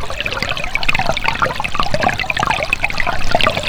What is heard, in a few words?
stream